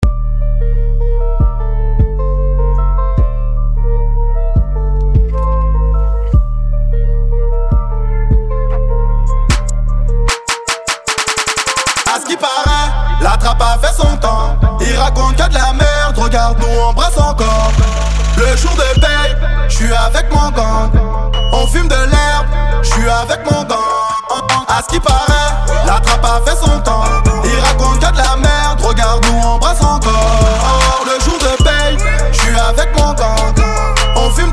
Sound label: tuba; tuba life